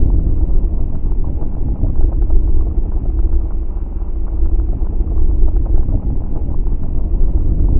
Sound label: Atmos; In the Line of Duty Firefighter